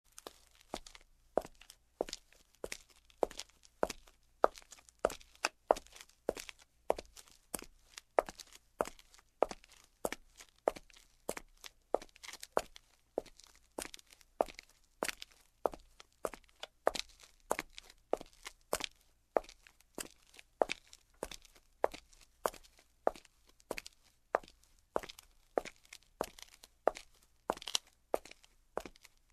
Steps on the marble floor in shoes

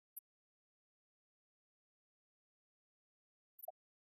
Motorcycle signal

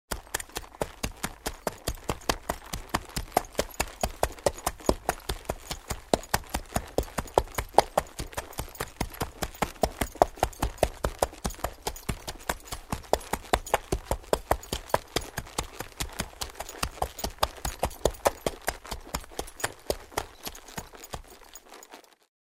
horse walks on the ground

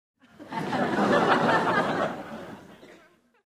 Laughter of a small crowd

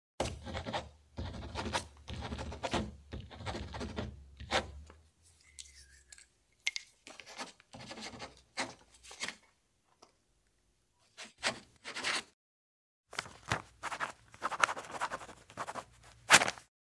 Writing with a ballpoint pen

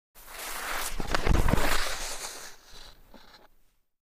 Downhill skiing; snow

